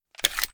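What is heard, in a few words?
pistol reload fast mag out; reloads; gmod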